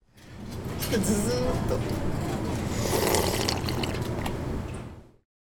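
tachigui sobaya de soba no shiru wo suu oto, sound of slurping soba soup at a standing soba stand; 立ち喰いソバ屋でソバの汁を吸う音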